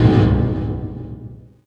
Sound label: hipcutter echo end